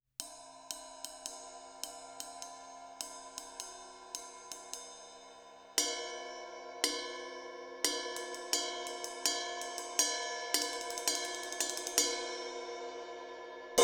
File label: Cymb Ride; Close Mid